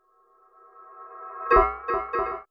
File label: Tape Arp